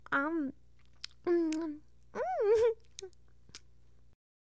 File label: Eat; Kitchen; Hilzartov; Fairy Size Doesn't Matter